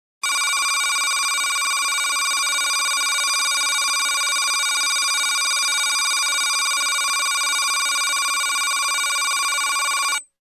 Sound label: Synthetic Telephone Ring Long